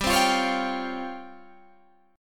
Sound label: Db Major 7th Flat 5th G, chord